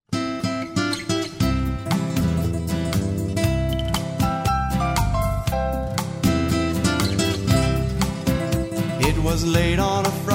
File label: Vocal Sample